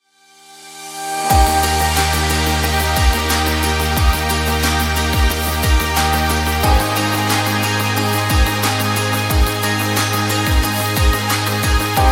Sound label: Relaxed Pop; MIUI12